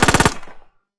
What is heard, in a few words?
Muzzle flash multi; Hovl Studio; SFX Battle sounds; WhiteMan Unity2D